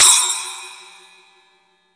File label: SPLASH; East Collexion; Drum 1 Dry